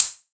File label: Hat, Dubstep One-Shot Drums